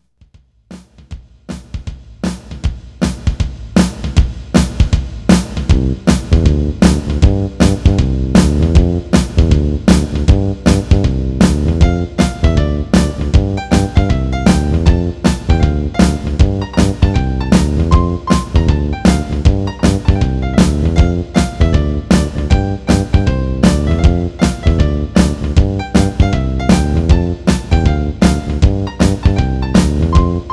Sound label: melody version